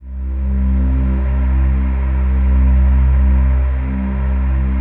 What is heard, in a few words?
PAD; Best Service; XX Large; Pads